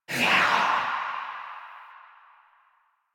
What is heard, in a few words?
Choir Dash; Warframe